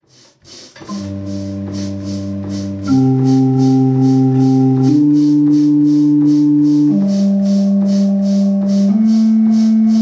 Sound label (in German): tonleiter orgel